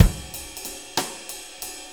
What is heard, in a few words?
6-8 Loop, Full Kit Loops; Drum Tools